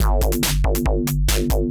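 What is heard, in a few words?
DRUMLOOP ELECTRO 140; Techno2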